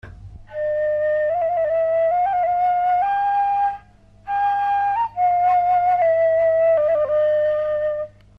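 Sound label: Bayati tetra chord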